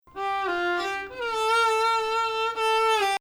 Bayati Samai Husseini Nahawand, emphasis on 5 descent to 4; Samai Bayati il-3ariyan